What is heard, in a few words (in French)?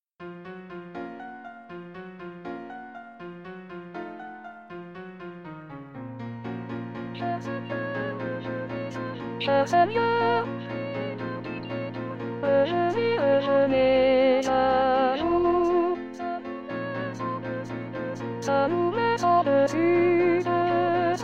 Choeur des dames; Variations